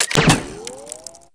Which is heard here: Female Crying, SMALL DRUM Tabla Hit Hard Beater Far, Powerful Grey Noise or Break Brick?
Break Brick